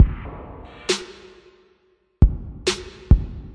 135 bpm, verby kick bap trap, drum loops and breaks; 131 to 140 bpm; samples-ghost